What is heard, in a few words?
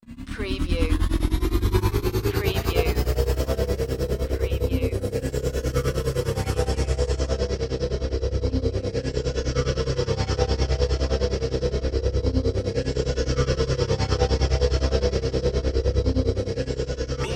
Hybrid Pitch Rise